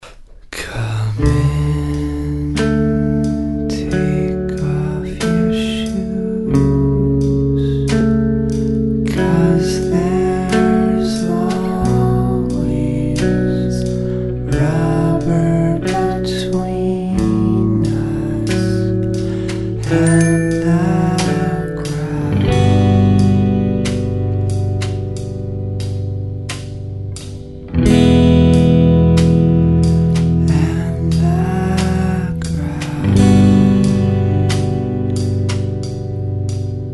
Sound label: Black Metal